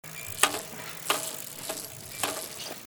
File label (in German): fahrrad kette